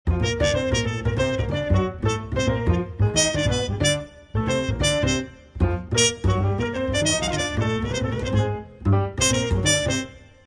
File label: melody